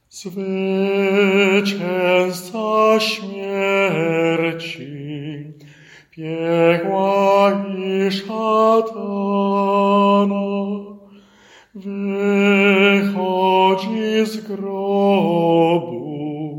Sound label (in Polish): SOPRAN; Zwyciezca smierci